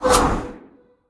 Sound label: projectile; smite; gilgamesh